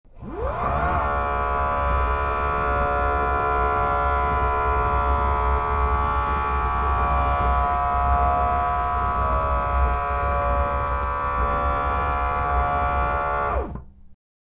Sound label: Drill Barrel Rev, servos